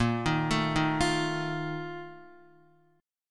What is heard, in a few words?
A sharp Major 7th Suspended 4th, down, Guitar; arpeggiated; 4F,4A,5C,5G,5C,6E; JGuitar